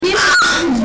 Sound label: ahh